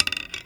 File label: Arrow Nock; Timelock VR